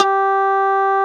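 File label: Guitar Mute B2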